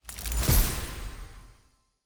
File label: missions reward reveal